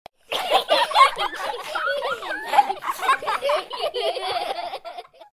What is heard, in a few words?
kids laughing taking a bath